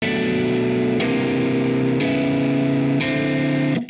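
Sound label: amp guitar chords, Hybrid neural guitar overdrive; zendrive; Morphdrive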